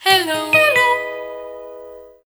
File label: Hello, notifications; LG G2